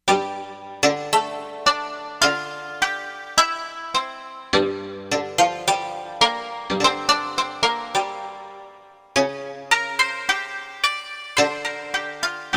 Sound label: rich koto, Korg; Korg MSC-13S Ethnic 2